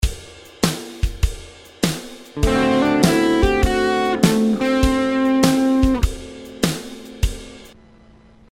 major 6th D7; 3 essential notes; better blues solos

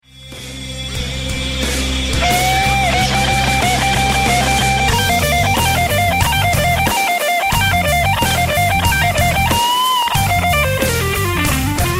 Solo, guitar

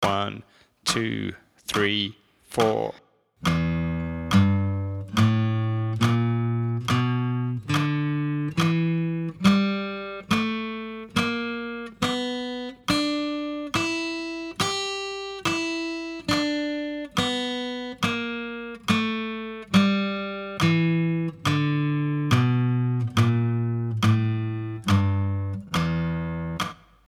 scale e blues, guitar

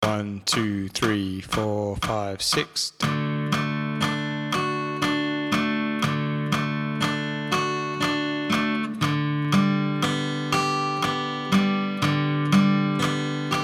chords, guitar

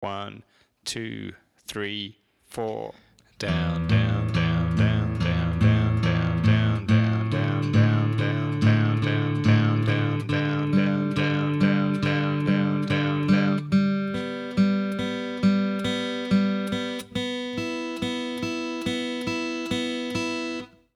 picking double down, guitar

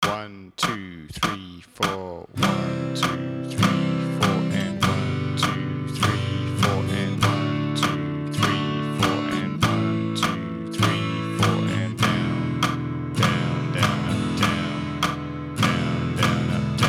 chords, guitar

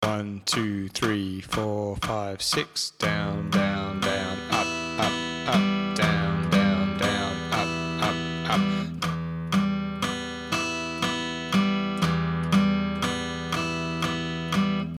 everyone feels em, guitar

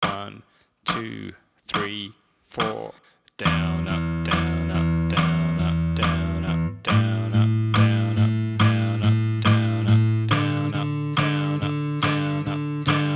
picking alternate metro, guitar

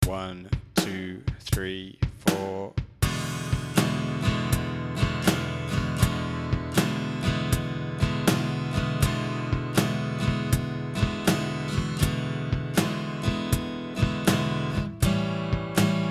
blues E chords, guitar

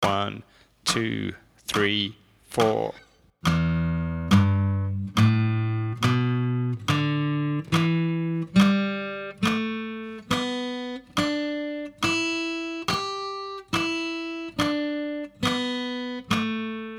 scale E pent, guitar

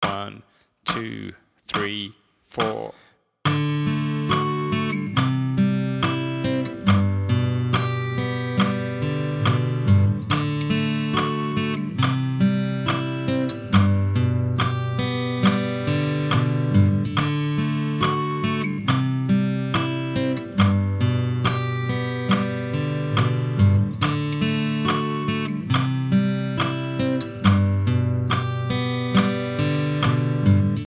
arpeggios, guitar

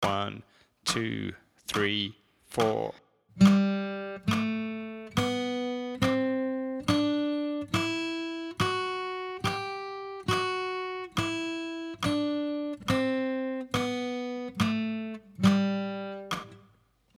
scale g major, guitar

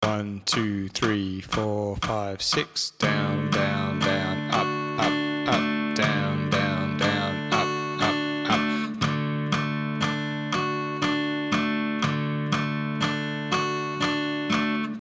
everyone feels, guitar arpeggios

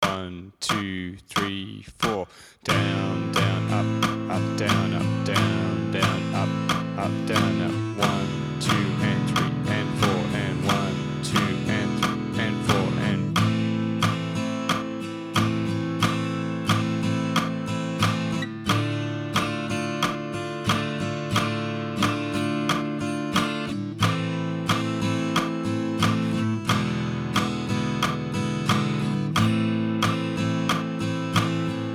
chords, guitar

